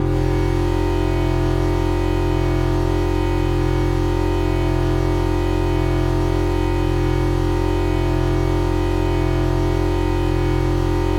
corporate medical test negative relief

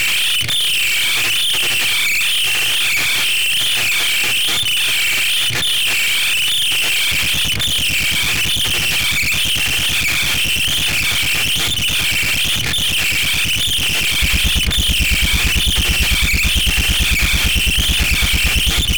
alarm; animalmachinebrain

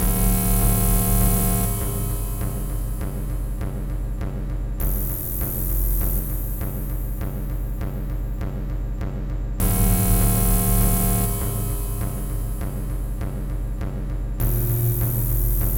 march; tensionbrain

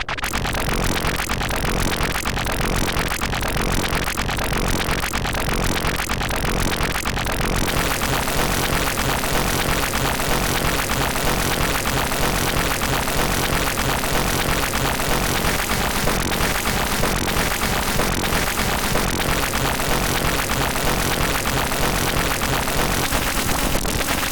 electric water; fishbrain

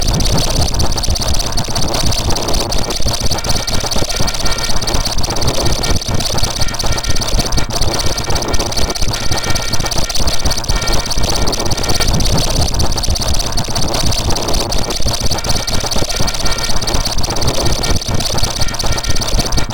corporate medical test dread

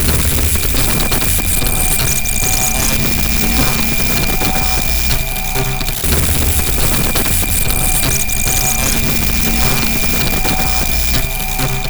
ring; telephonebrain; doomscroll

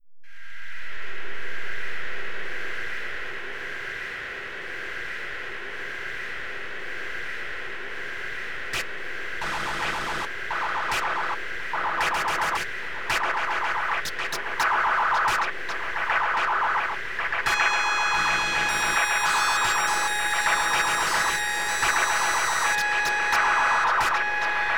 noise; industrialbrain; preference for noise

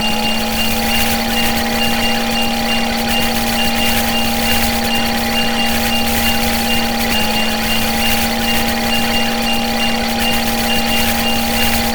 ring; telephonebrain

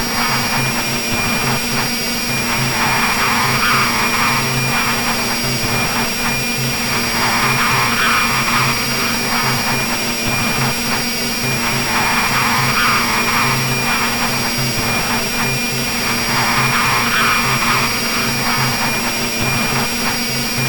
robot, throbbing electric organism; technologybrain

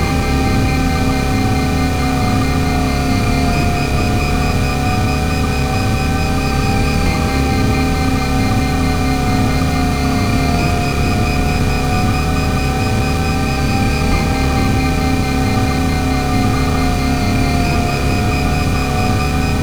wind computer; networkbrain

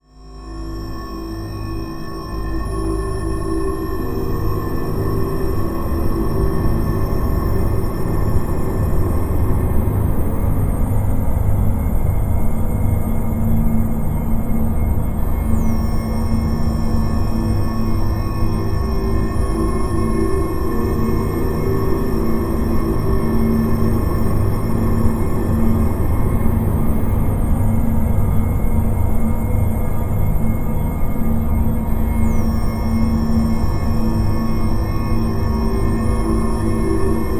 air meditation

